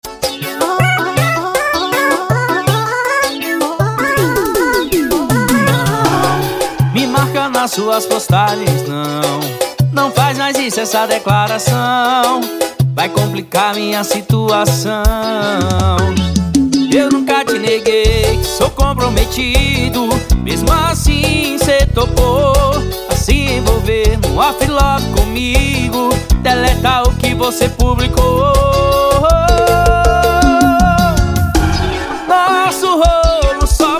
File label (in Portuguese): piseiro